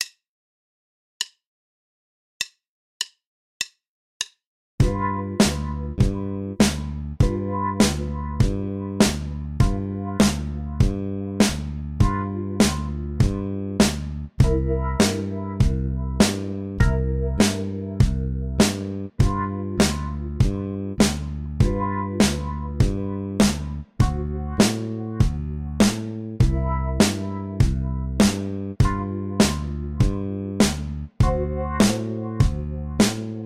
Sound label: 12 Bar Blues In G w Bass